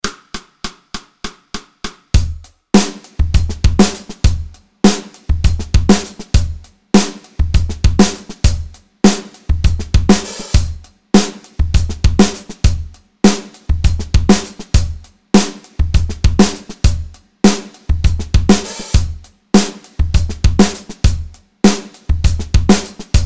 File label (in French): Drums butee groove tempo 100; Grooves Slap